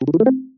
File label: timer start